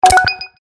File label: ui pause